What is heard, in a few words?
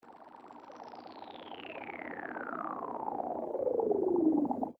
Synth Sweep; Multitrack